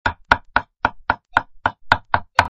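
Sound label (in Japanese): manaita, cutting board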